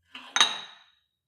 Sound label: weight rack insert weight hard, fitnessstudio